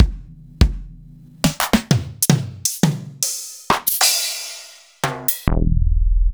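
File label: Roland MV8800 drum RNB SOULKIT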